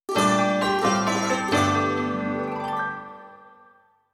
unlock; Nelke & the Legendary Alchemists Ateliers of the New World

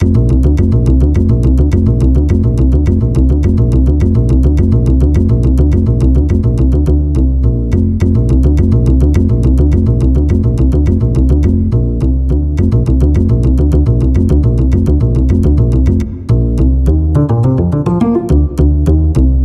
Bass

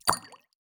Potion Sound

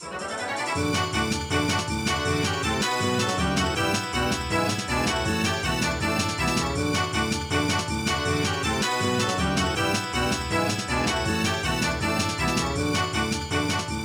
Win Prize; Pokemon Dash; nds

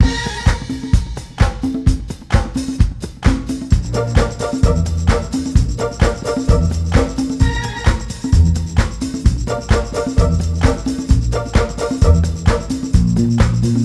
Guit

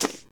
menu down; SpongeBob Diner Dash

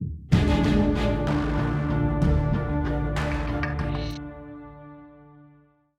190bpm; Legends of Pegasus; windows gamerip 2012

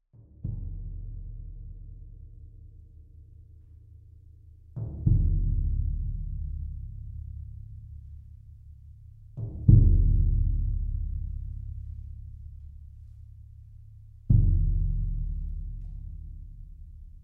Creepy Strings with Dark Pads Mood; gamerip; the last of us

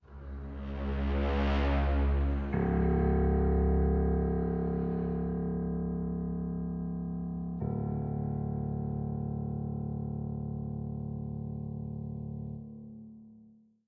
Cave MELODY

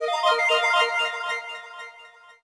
Win; MGDigits; Dark Dimensions: City of Fog

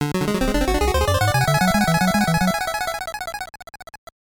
Period Start; Robo Warrior; NES

